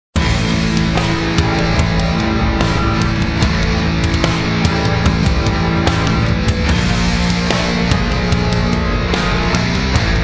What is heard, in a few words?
bgm; Bill